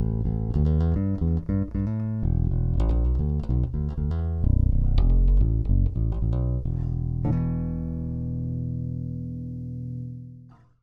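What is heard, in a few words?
Thunderbird Active Neck, 5-String Pickup; Lollar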